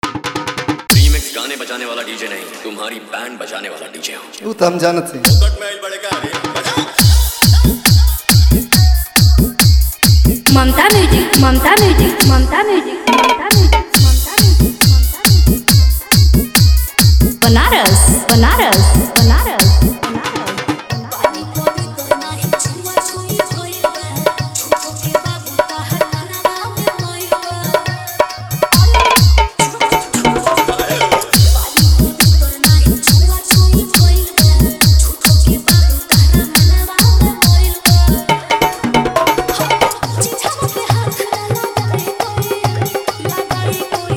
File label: New Bhojpuri Hard Vibration Dj Remix Song